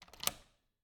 Cabin, front door, deadbolt, lock, latch, thick, close, loose, wide; Eiravaein Works; Vaeyan IV